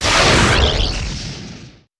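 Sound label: Electric Field spawn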